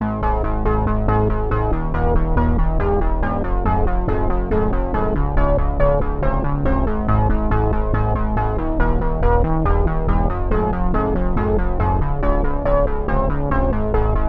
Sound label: Oscillator BASS Rubbery Rebound; Alphatron